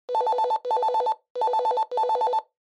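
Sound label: Handheld Transceiver Signal Transmit Call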